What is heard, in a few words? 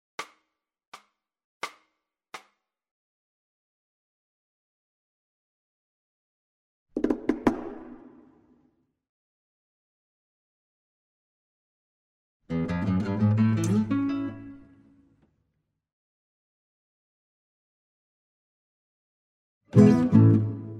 guitar